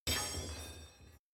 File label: Broken Glass